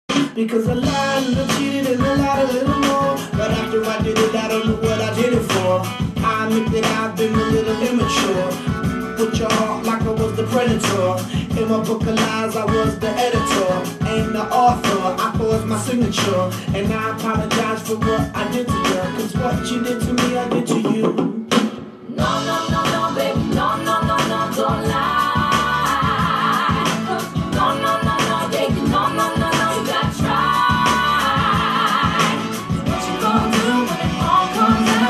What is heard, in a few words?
song